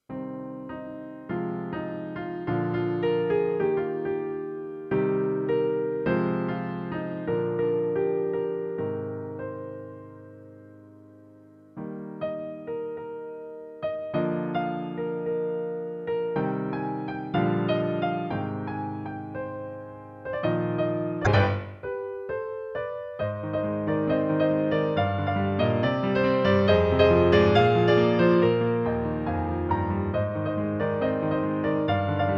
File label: Piano